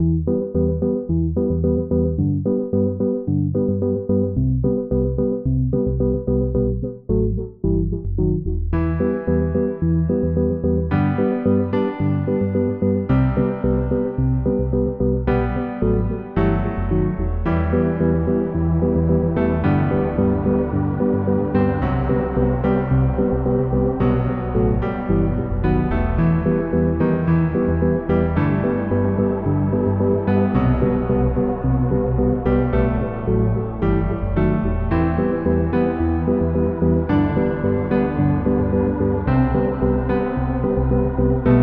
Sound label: foggy piano; SussyCraft; amogus general